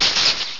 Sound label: Wild Charge; battle anims; PokeRogue